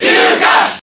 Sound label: Cheer Japanese; Lucas; SSBB